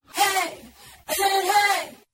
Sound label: "hey i said hey" SUNG, voice; ooh ahh